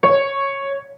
high C sharp

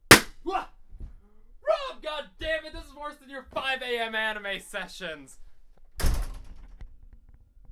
Yelling

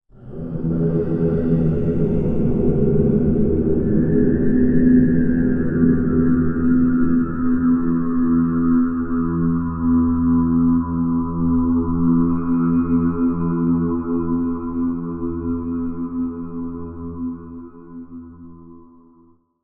Thunder Processed IR

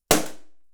IR Tile

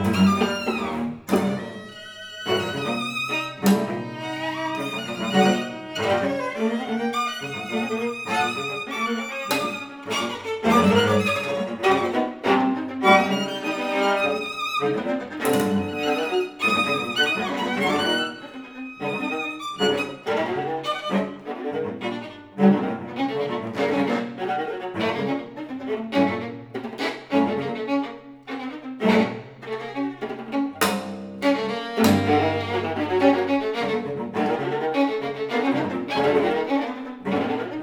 String Quartet

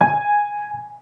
high G sharp

